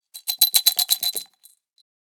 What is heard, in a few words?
Pouring pills into hand glass vitamin bottle; medicine